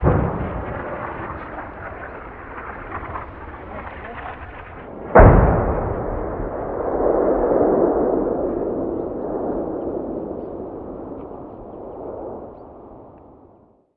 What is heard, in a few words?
artillery med; ModernWarfare